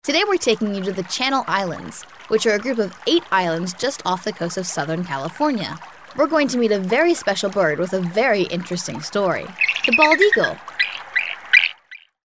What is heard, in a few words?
Bald Eagle with sounds